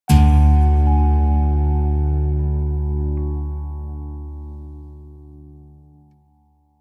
Deep Thinker Ident

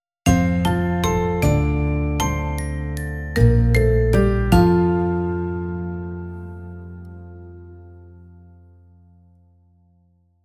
Happy Ident

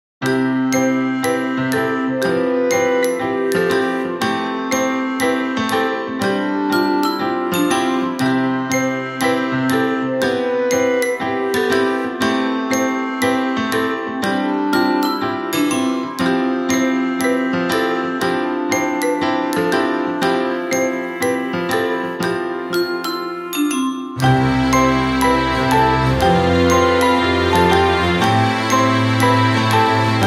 Childrens TV

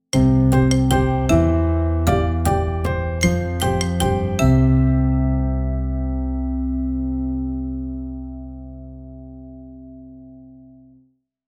Happy Ident

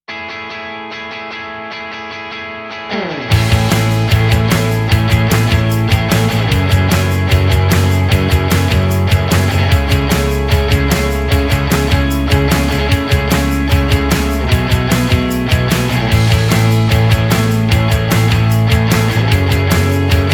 Indie Rock

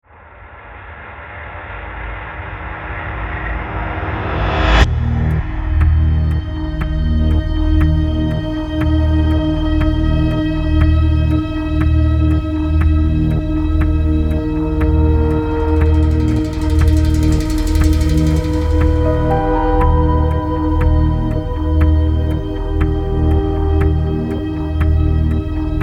Time is Ticking

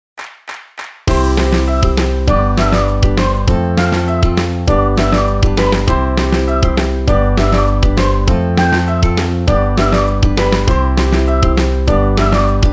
Joyful Spring

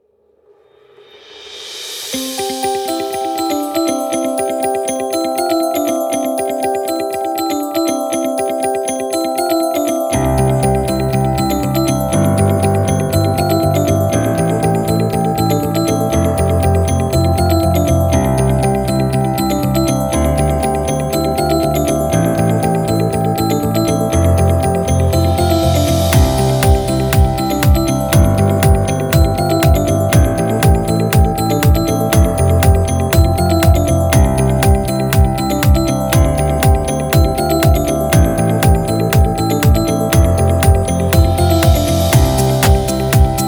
Corporate Business

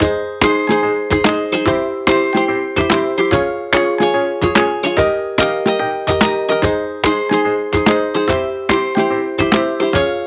Carefree Morning

